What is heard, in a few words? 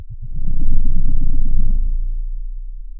pop, Sound of a dinosaur